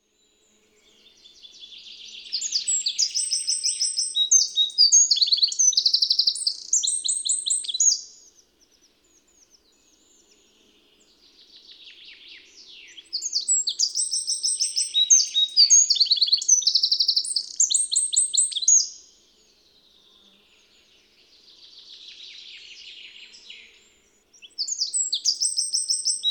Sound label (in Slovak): oriesok, Spev Vtakov